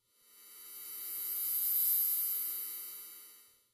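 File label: Electric Buzz By Pass; buzz & hums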